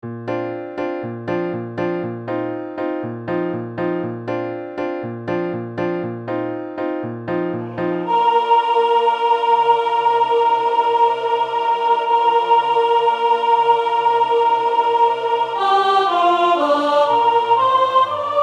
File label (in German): Sopran; West Side Story